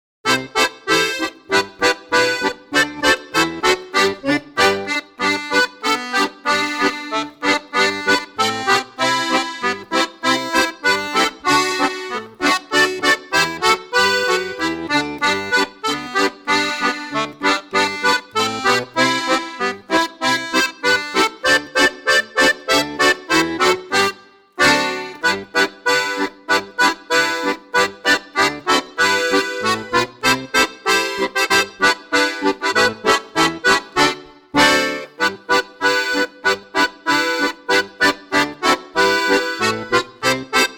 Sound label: JINGLE BELLS